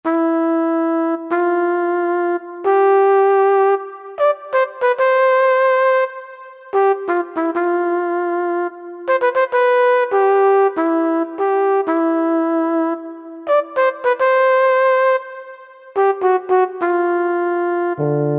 Tuba Flugel, Korg M1; Factory preset examples

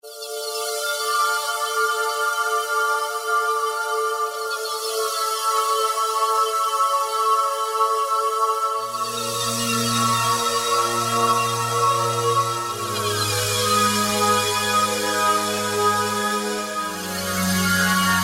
Fx Telesa, Yamaha EX5R; Factory presets

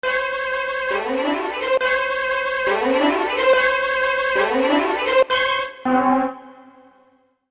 StrgHit, Korg DSS-1 Strings

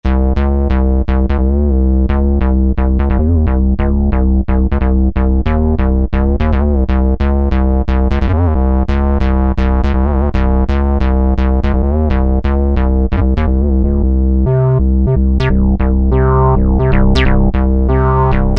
TB Square; Roland SR-JV80-11 Techno Collection

